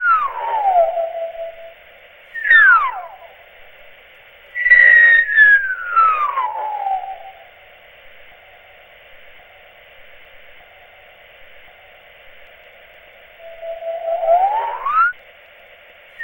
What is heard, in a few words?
Alien; Pitch Black